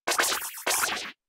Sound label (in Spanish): Grito de Arrokuda